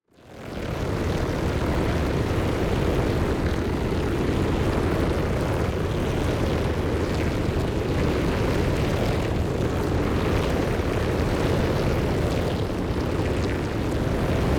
TEXTURE Plasma Rain; boom library; Matter

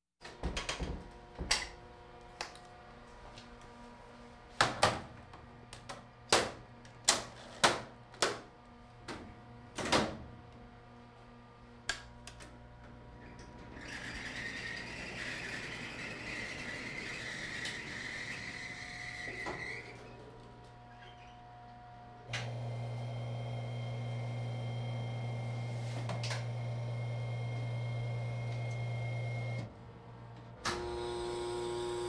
Hospital effects

